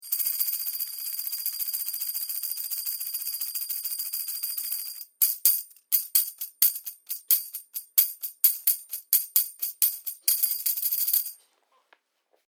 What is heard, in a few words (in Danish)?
tamburin lille; corvus; lille tambourin